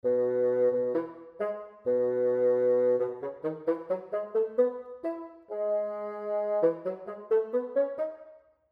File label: Bass Clef Example